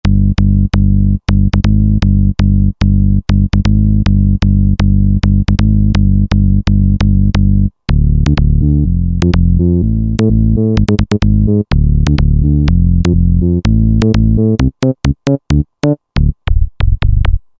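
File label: SH101 Zap Bass; Roland SR-JV80-04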